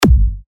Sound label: TECHKICKS G, techno kicks; Wowie; revealed techno kicks vol 10